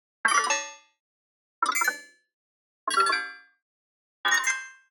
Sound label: Digital Click Neutral